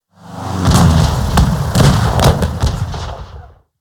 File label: Skybarge Attack